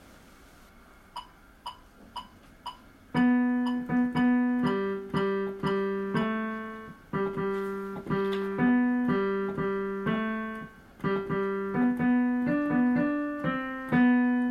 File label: Tenor, Accompaniments